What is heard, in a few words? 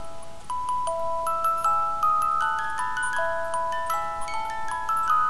Music Box